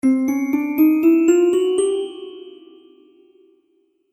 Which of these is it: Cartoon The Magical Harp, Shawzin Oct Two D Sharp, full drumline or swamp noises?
Cartoon The Magical Harp